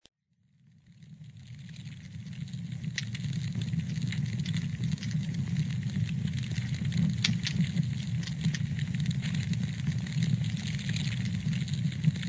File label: Fire